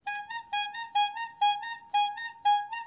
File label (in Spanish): BOCINA DE PANADERO CABO BLANCO